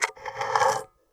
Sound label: Coin On Collander